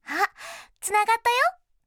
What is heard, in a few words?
device disconnect; Windows; Madobe Nanami